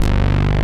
FATZ BASS; Pro-Rec - Synthsonic; BASSES

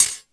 CHH, Drum & Bass, 150 BPM; Ueberschall; Drum & Bass Resonance

